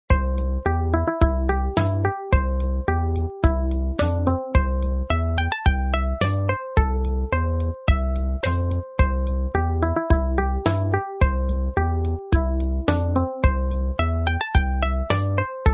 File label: Loop Slow